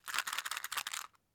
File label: Matchbox Shake